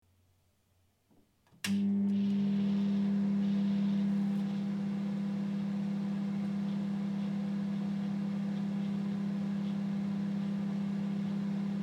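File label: mikrovolnovka lg, microwave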